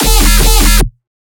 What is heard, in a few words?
Bass Hits, Dubstep; Terror2; Advent Calendar 2017 Day 21 - DubstepBass